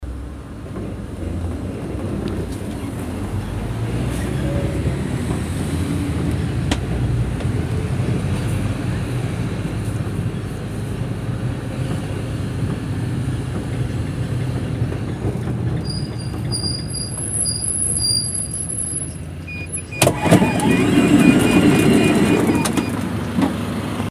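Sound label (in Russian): звук маршрутки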